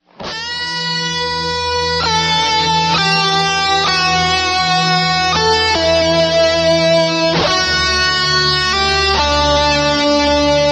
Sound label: Guitar Solo; Inferno; Instrumental Rock